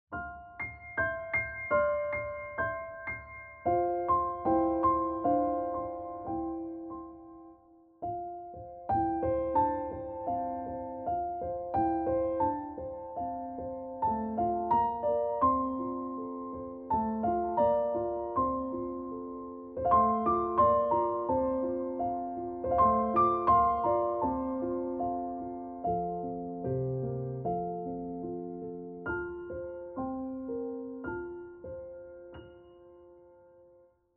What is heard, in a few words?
Are You Sleeping Classical